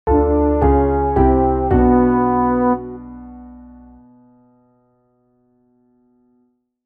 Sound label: Detention Lose